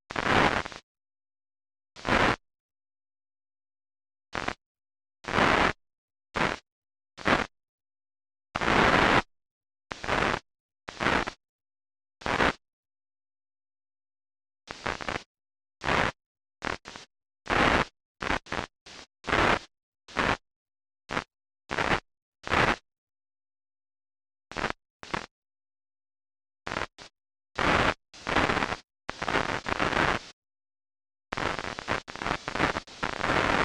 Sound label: Vinyl Dust Dynamic